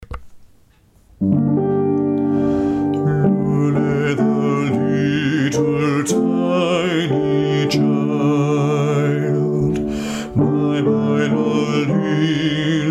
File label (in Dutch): Coventry Carol alt